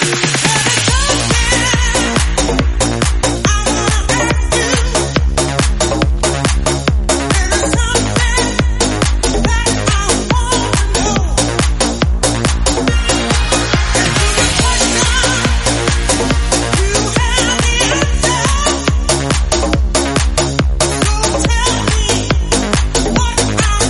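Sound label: 104 bpm B flat minor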